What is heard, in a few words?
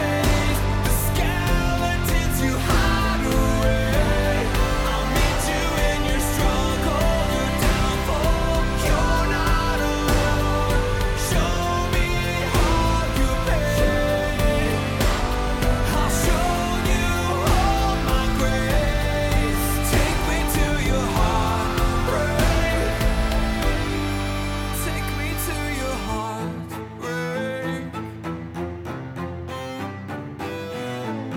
Big Choir